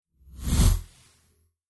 Whoosh Sci Fi Space Vehicle Small Fast, Whoosh Space Vehicle Small Fast Spacecraft; Spacecrafts; BBFX